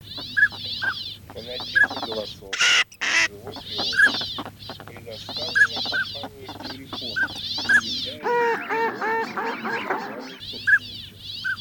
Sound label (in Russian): Шилохвость Codone; chip mini 234 plurifon